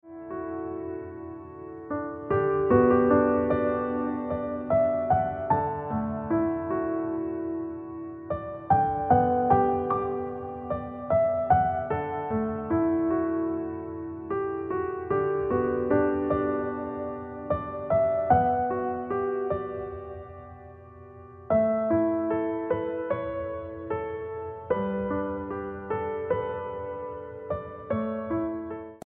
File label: Ringtone Piano Music